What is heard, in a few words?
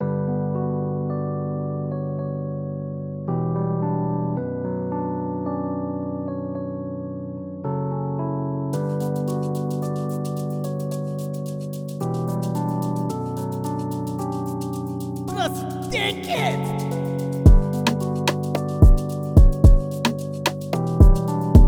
Drained 110 BPM Key B Minor; BrasiBeats